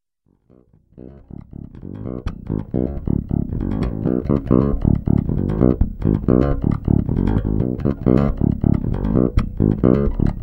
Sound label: fingerstyle bridge pu passiv, bass